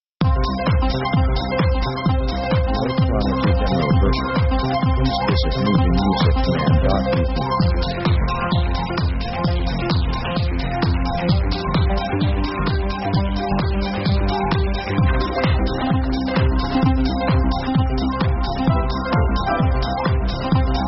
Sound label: joyful electro pop